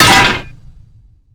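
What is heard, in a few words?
metal hydrant impact; Call of Duty Modern Warfare; physics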